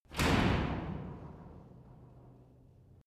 garage door shut

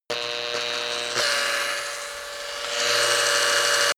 drill electric polyphonic; maschinen; bohrer; soundpool

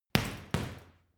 boxing punching bag side hook double hard

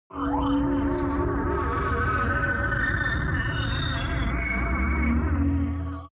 sci-fi mysterious galaxy beauty; horror

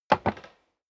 step sneaker wood creak jump

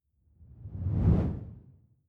whoosh simple incoming low; swooshes-swishes

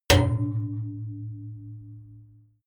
metal impact small hard long

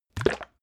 bottle syrup upend glug; berufe; gastronomie

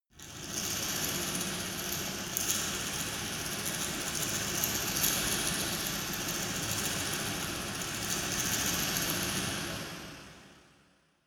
dark resonator rattle fast soft; maschinen

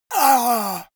monster gasp; kreaturen

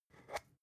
spice jar scratch tonal open fast; industrie wirtschaft; gastronomie

